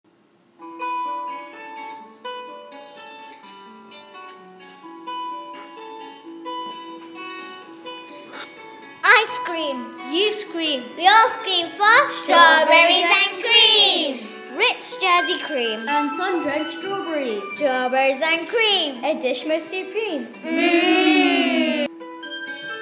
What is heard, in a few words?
Ice scream